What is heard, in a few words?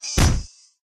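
leprechaun block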